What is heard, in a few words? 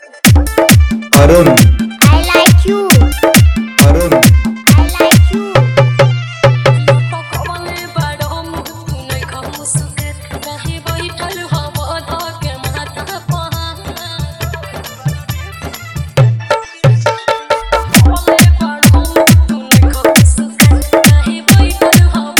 Bhojpuri Remix